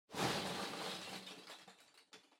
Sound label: Loose Fill Packaging Far Scoop Up; 梱包材